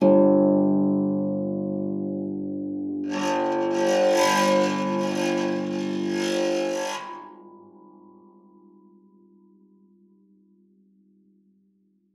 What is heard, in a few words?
PEDX F#1, harp; Xsample VOL-8; HARP+FX